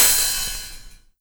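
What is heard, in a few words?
HH open hi-hat, Fresh Disco House; USB Soundscan vol.20 - Fresh Disco House I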